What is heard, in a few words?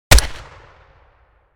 SPAS-12 combat shotgun single shot